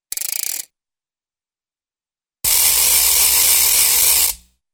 Small Short Ratchet Clicks Winding Or Unwinding, cartoon; Hollywood Edge; Cartoon Trax